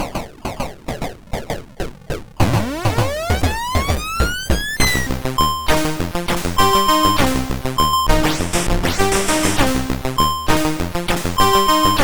Synth; MOS6581R4

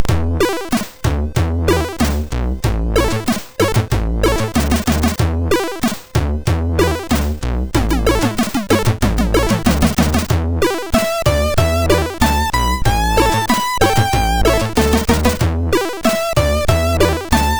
Intro, sid MOS6581R2; Contex Intro

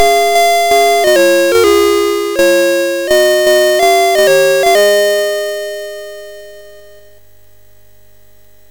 sid MOS6581R3, GAMES; Alice In Wonderland

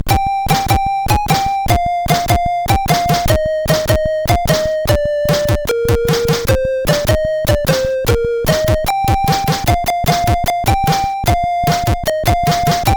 Oh Flute, CSG8580R5; sid

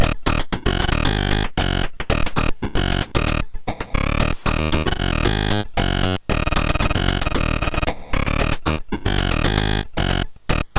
sid MOS6581R4, GAMES; Break Dance

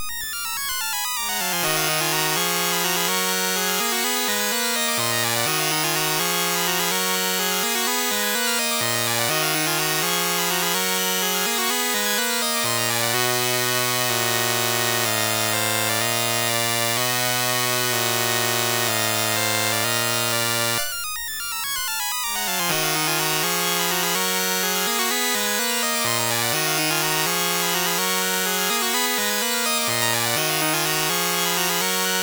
Dark Castle sid MOS6581R2

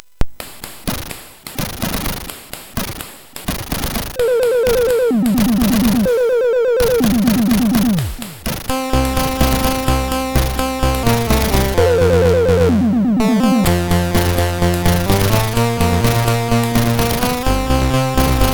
Remix, MOS6581R4; hvsc

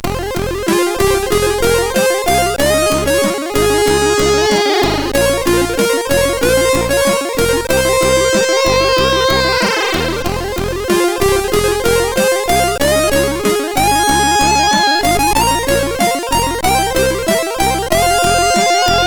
End Sax, CSG8580R5

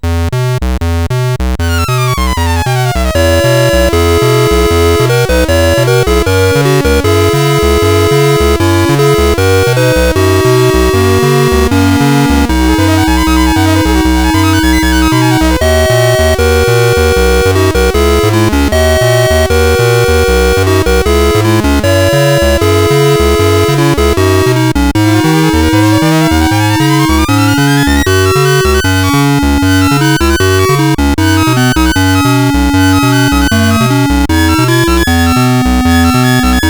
Music sid CSG8580R5

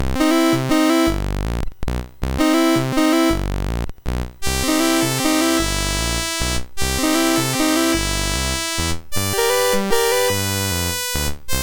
sid, MOS6581R3; Silent Night BASIC

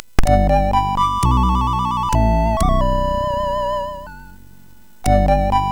Jingles, MOS6581; MOS6581R4; hvsc

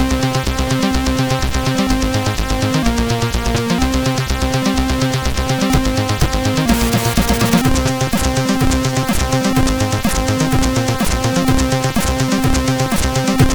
Beat Machine, sid CSG8580

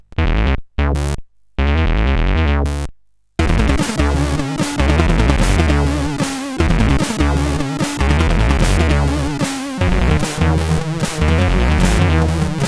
Scanner, CSG8580R5

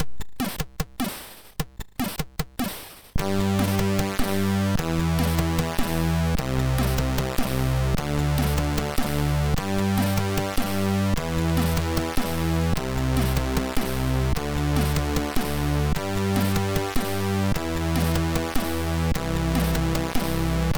High Score, sid MOS6581

